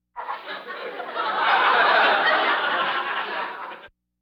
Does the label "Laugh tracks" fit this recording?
Yes